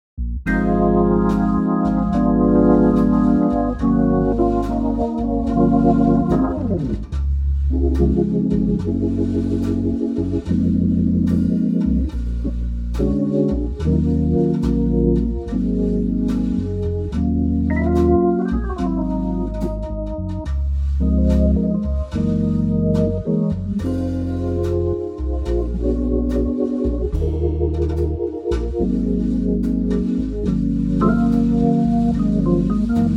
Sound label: organ Db